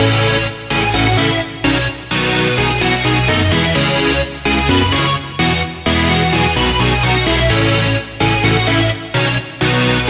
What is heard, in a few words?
Lead; The Base; Routed Sound; Vital Expansion - Hyper Gamer Vol.1